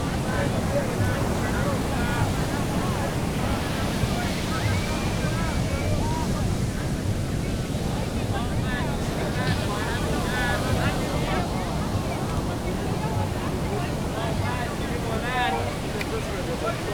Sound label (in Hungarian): Copacabana, tömeg, limonádé árus